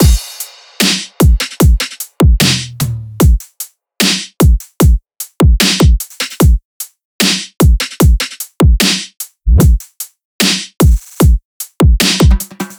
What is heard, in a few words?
drums 150, Full Drum Loops; drum sample pack; Udina; SEISMIC; Slate